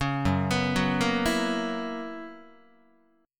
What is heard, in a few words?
Gb 11th, Guitar